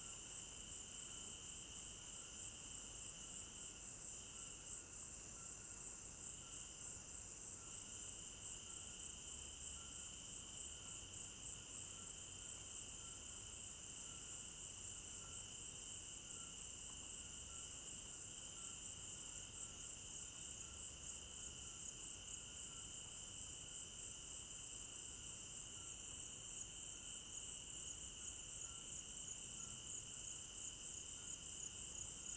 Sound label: African Village Garden Night Crickets Stereo Binaural